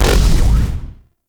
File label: Pod Shotgun Proj Fire